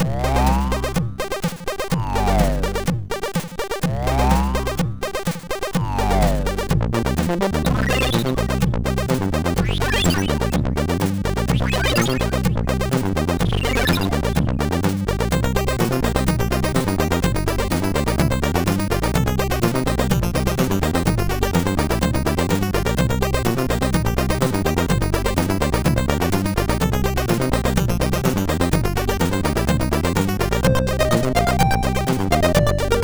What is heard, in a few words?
sid MOS6581R4